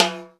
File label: HED P TIMB; 90 sSampleCDs; Crypton - DrumBase5000